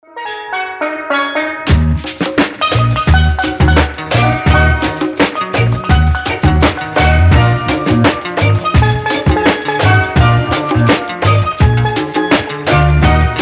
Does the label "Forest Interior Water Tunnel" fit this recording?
No